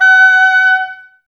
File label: OBOES F#, Woodwind Brass Ensemble; Wood Winds; Miroslav Vitous